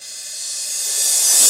Reverse Cym, Cymbals

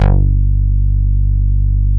MOOG, Source Bass

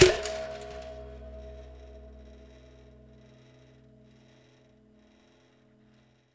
Prepared Drums

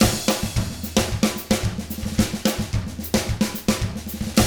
110 SAMBA, Drums; ILIO; Double Platinum Drums 1

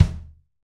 Rock Kick; Roland BK-5

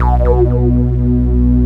SYNTH LEAD; Mostly Leads

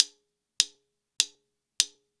Countoff Click; Sonic Foundry (Sony Creative Software); Joe Vitale Latin Percussion

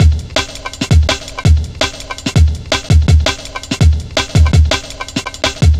Drum Loops, 165.5, Jungle

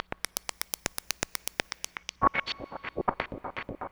Glitchy, Beats; Sonic Foundry (Sony Creative Software)

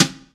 POP BRUSH SD; E-MU Formula 4000 Series Vol. 2

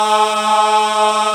GOSP. AAAHH; Sound & Vision - Gigapack II CD 1

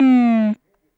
Drop Lo Speaker, Stylophone Beatbox

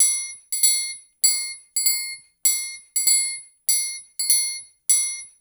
percussion loop

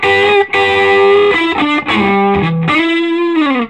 SOLO E, Vintage Blues Guitar; AKAI; USB Soundscan vol.22

